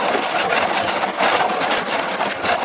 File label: Wood Jib Loop; XBOX; Amped 2